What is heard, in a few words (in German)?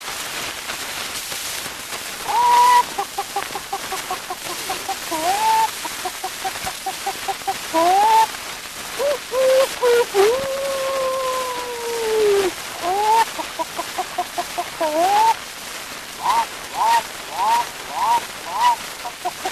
thierstimmen; 5 inch Berliner